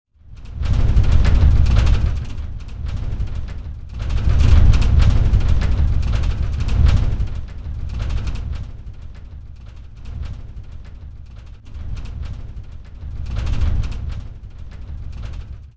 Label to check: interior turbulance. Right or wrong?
Right